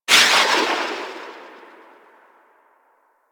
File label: Dex Furis Tail B; Tenno